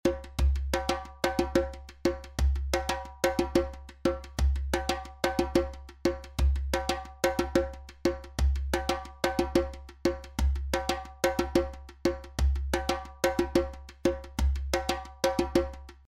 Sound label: djembe loop